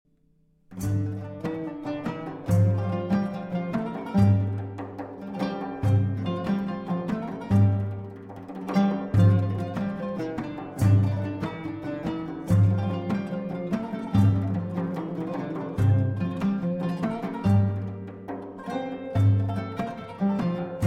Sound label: Canone all'Ottava; Goldberg Variations, BWV 988 No. 25, Variatio 24; a 1 Clav.